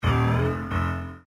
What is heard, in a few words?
personal music